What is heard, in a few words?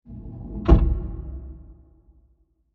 Scary Door Hit; Crescendos; Impacts; Sound Effects:Impacts; CraftedSignals